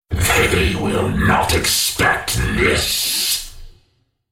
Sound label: Droid spellcast effort